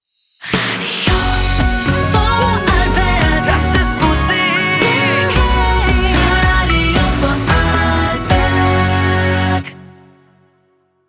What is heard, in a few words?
Radio Jingles; ORF Radio Vorarlberg